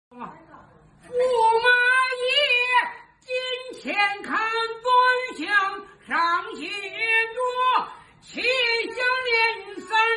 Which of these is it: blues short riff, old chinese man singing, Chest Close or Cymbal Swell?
old chinese man singing